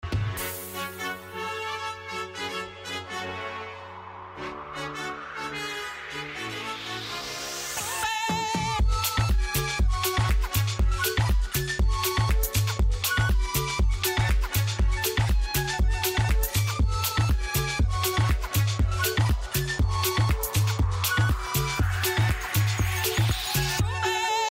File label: EDM